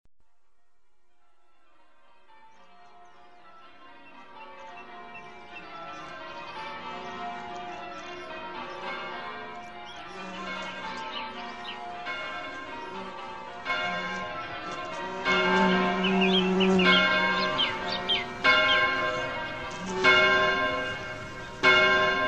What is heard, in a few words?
Bell